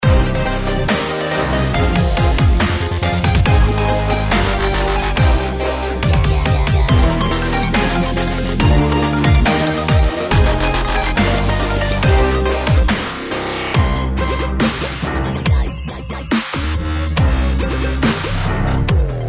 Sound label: ringtone; Clash of Clans